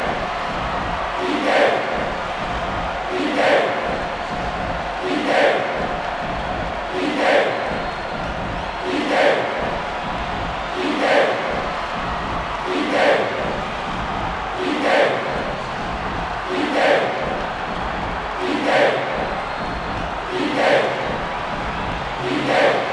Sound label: Crowd Song; mario strikers charged gamerip